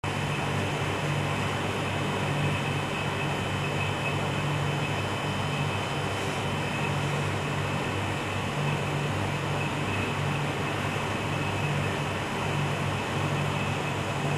film fan

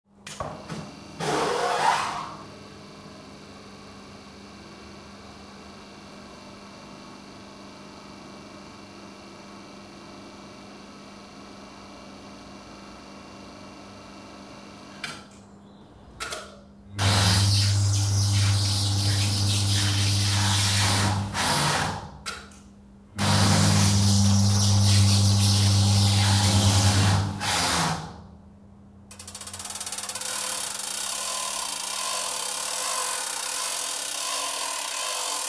observatory

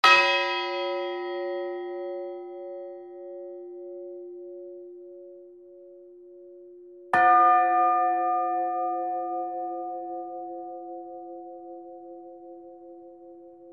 bells

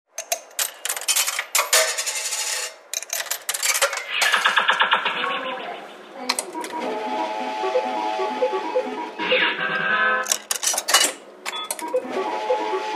amusements

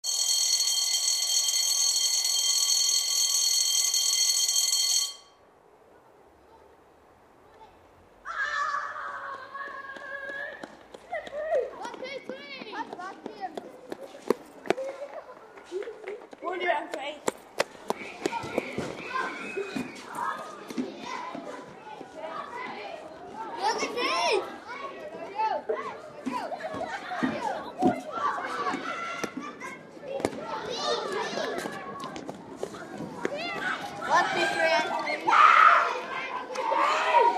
breaktime

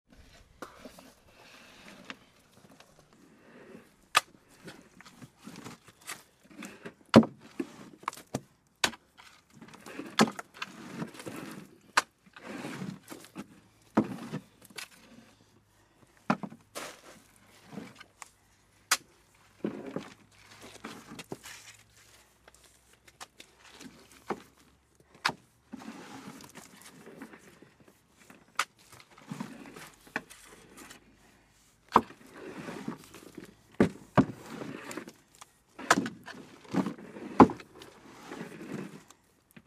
gravedigger

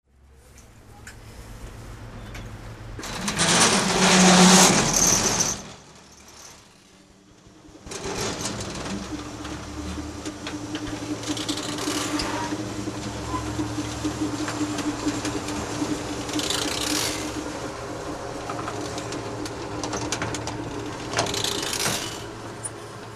shutters

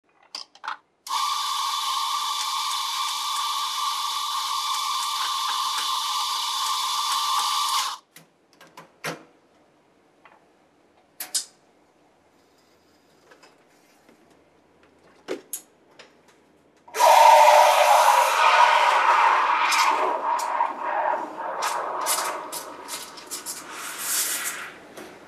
coffee machine

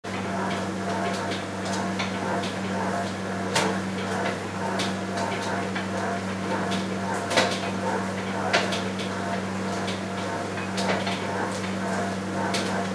bakery

